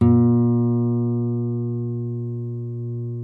NYL NV C, nylon no vib guitars; Spectrasonics; Hans Zimmer Guitars VOL-1

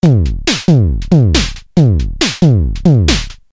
Loop 138bpm, Drums; leloops

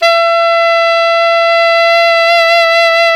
SAX ALTO MP, Alto Tube; Roland LCDP07 Super Sax

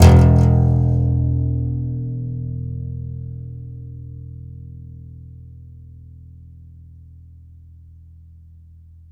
C. Bass Balalaika, Plucked Strings

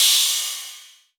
Rides, Cymbals

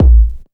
bass drum deep, techno trance drums; Ueberschall; Techno Trance Essentials

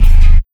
808 Bass, Fx; Sonic Foundry (Sony Creative Software); Esoterik Beatz

